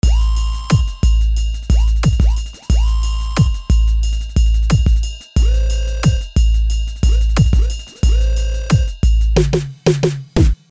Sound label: assorted kits